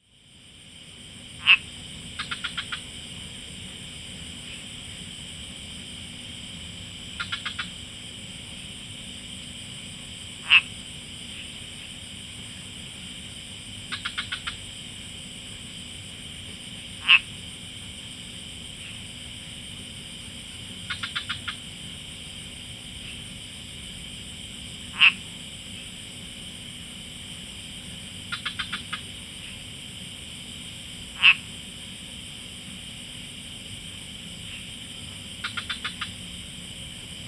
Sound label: ubud frog pond